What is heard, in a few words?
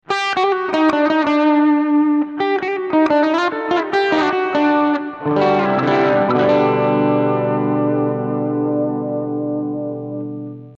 good jazz lead, guitar; овердрайва; BOSS GT-6